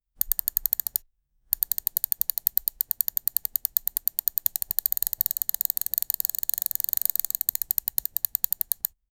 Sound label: long smooth scrolling of, mouse wheel